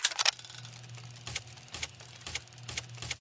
spin Button Click; steamtower

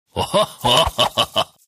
panda Large Win; shangrila

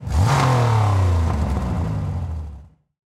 Car Engine; knight rider

